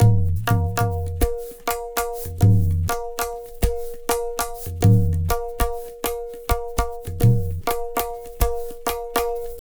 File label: Tabla, 100 BPM; Zero-G; Pure Tabla